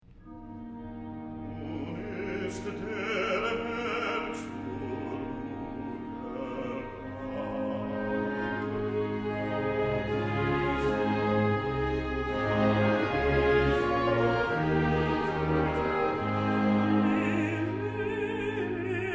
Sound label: Recit Chorus